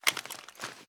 mag take; tacz; daffas arsenal

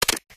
mag hit, hk416; tacz; daffas arsenal

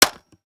mag in; spasi15; tacz; daffas arsenal

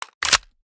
mag in; tacz; daffas arsenal

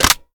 mag in; tacz; daffas arsenal

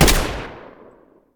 sg550 last; tacz; daffas arsenal

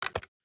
start reload; tacz; daffas arsenal

